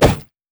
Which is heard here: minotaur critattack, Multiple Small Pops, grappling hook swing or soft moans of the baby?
minotaur critattack